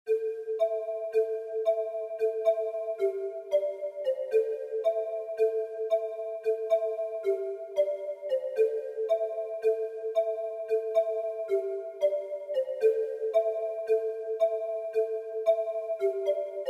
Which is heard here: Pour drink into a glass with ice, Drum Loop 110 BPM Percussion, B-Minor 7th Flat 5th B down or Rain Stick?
Rain Stick